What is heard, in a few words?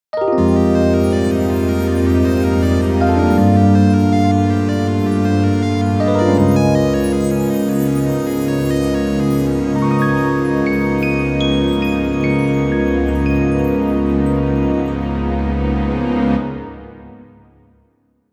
BGM WORLD CLEAR